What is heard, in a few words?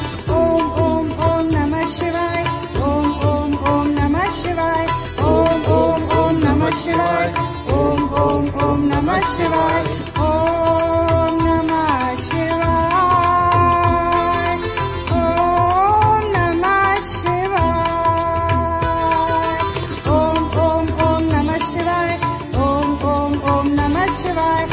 om om om namah shivaya om namah shivay om namah shivay